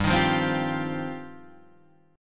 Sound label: G 7th Suspended 4th Sharp 5th G, Guitar Half Step Down